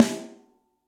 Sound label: Snare Hit & Rim Big Punchy; Drum Hits; Ableton; Factory Packs; Session Drums Club; MPE Hits